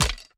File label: Bounce Spear; Going Under